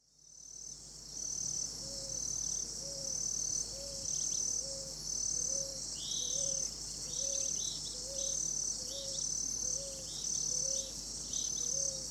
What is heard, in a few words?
cicadas with birds